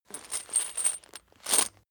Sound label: chain mail movement